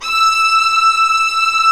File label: String Vlas Sect Agitato; String Master Series; Vlas Sect1-2